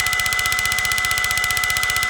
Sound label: chaingun spin; tf2